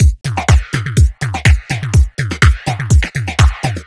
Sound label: 124 Drums, Drum Loops; 124bpm; Nu Dance Electro